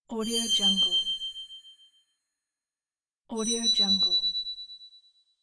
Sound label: star shine; sounddivision